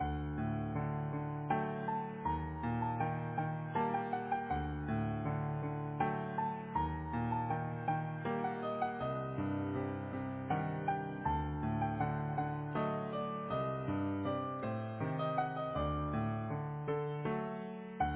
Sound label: Easy Piano